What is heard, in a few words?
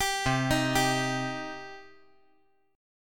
C Minor C down